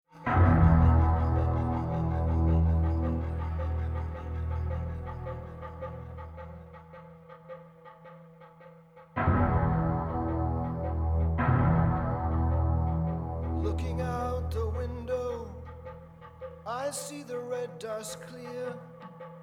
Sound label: rhythm, fairlight; Fairlight CMI II – 1980